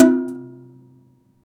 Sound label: soft, can; SFX Engine